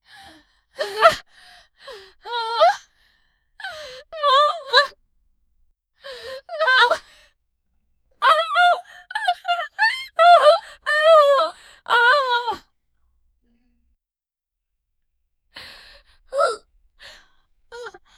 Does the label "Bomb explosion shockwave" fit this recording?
No